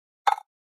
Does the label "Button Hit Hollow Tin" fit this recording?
Yes